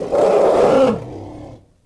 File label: Elephant Die Vox; Civ4 warlords